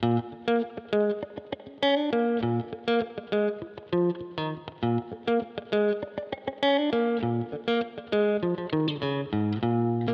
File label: ox amp top box fender single note black channel chorus reverb comp eq; universal audio